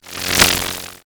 fighting game source foley synthesized electricity surge; flutter